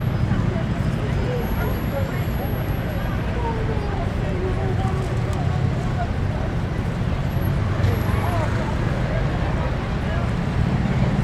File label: ambience london oxford circus traffic people